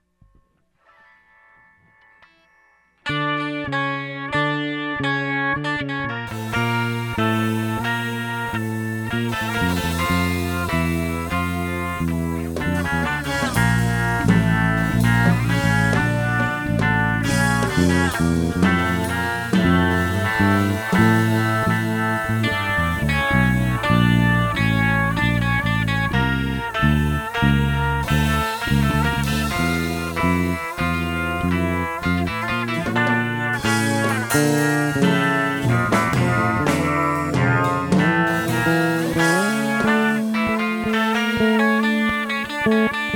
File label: 12 str frogs, 12-tet; micro